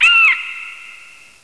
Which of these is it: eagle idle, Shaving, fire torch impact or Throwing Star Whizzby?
eagle idle